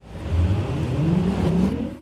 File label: train starting